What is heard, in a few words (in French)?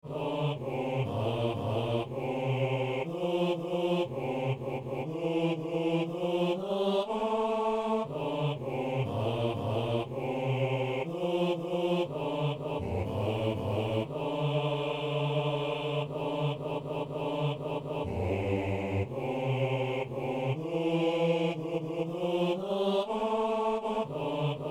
messe sanctus basse; messe de saint jean